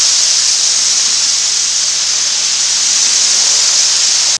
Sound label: WATER SP